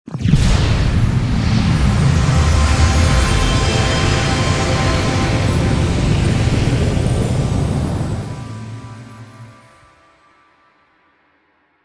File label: Building Purify Large; Level6; Journey